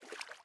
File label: ui pickup water; Rust